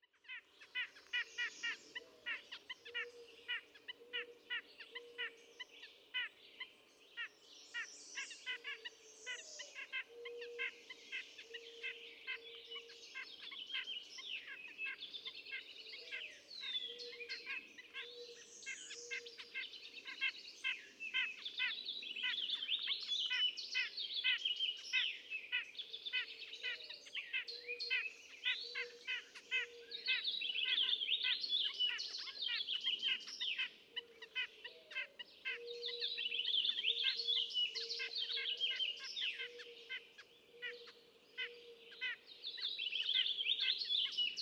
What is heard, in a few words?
White-breasted Nuthatch